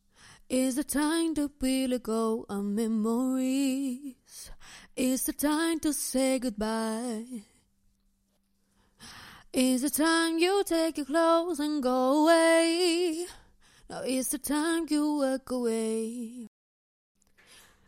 pop rnb 106 Cm

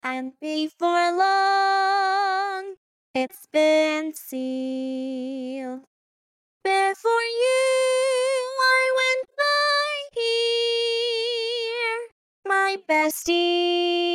Before Long, electronic, 77, Gm, acapella; GUMI Version

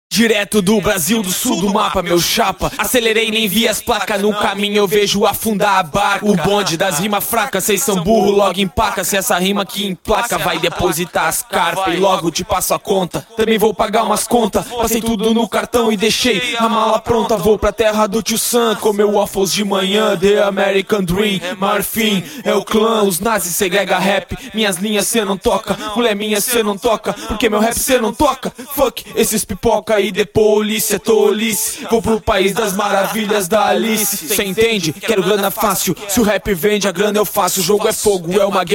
rap 86 Gm, Acapellas

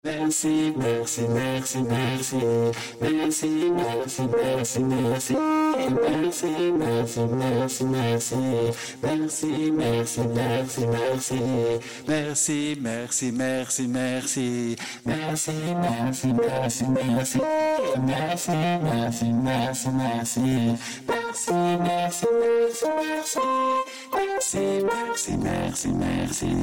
reggae 160 Cm, Acapellas

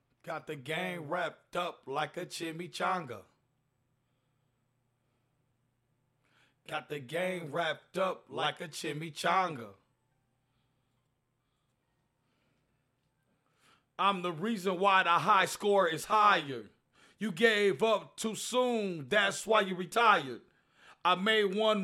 hip hop 137 Gm, Acapellas; Game Wrap Up

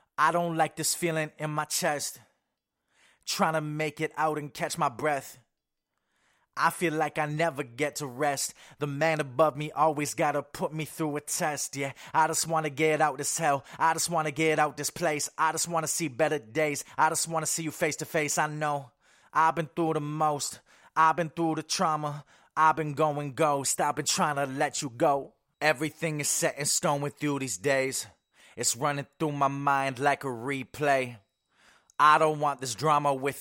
HH 75 Ebm

